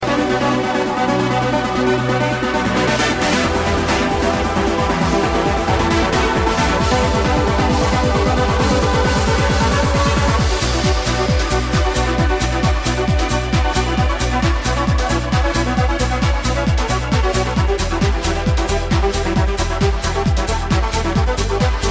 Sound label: Majestic Pipes